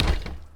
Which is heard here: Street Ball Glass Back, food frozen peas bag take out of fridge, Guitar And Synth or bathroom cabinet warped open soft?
Street Ball Glass Back